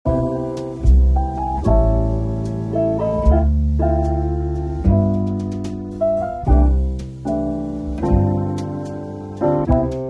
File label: Easy Jazz Instrumentals